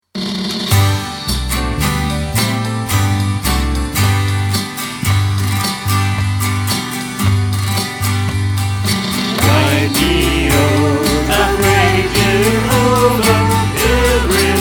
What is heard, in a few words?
choir; Guide Me O Thou Great Jehovah